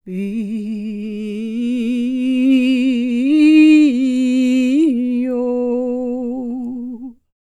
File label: SERBIA, Vocal World Voices SERBIAN; ILIO; Vocal Planet VOL-5